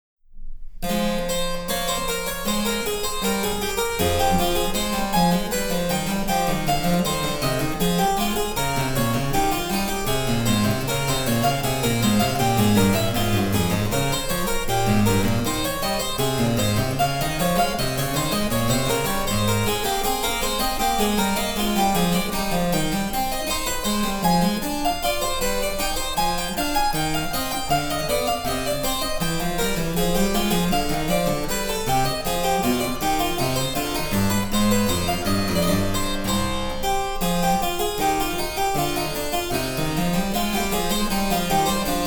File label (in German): Cembalo; Mark Lindley WTC 1